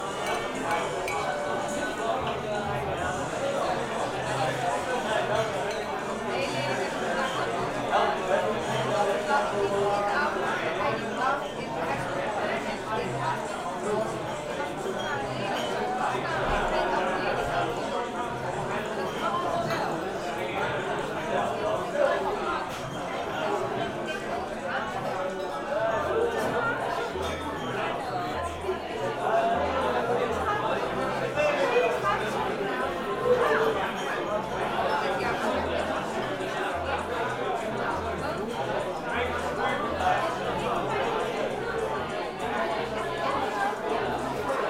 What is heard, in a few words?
Cafe